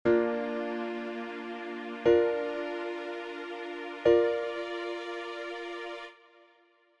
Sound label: Bb Maj Inversions All